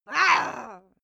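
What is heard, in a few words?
female aggressive, screams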